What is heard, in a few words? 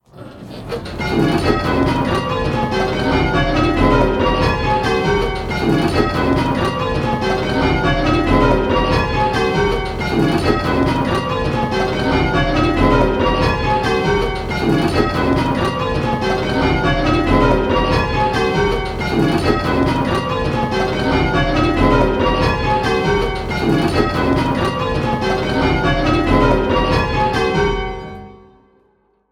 Striking 10-Bell Call Changes; Pebworth